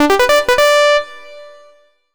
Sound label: Solo Clean Sawtooth Positive; MUSIC EFFECT; startide